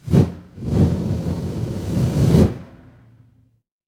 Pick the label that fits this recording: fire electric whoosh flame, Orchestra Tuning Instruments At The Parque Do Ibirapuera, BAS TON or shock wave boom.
fire electric whoosh flame